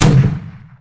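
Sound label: grenade fire, grenade launcher; Dystopia; weapons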